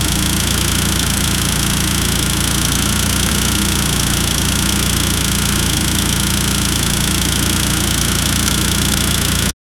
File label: tuned 2.0 litre 1980 bmw, engine